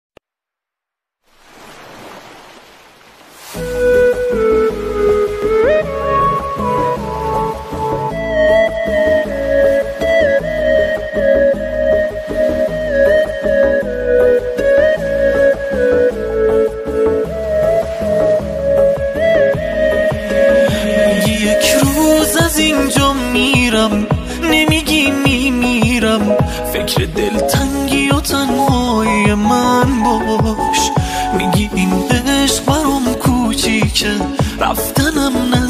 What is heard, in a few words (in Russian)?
музыка эрони